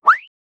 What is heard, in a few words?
cartoon miss sound slip slide